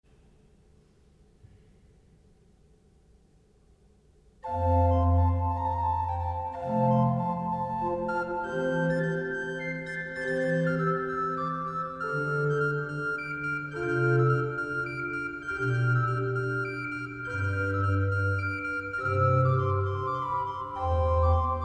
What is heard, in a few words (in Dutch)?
Woudfluit met Bourdon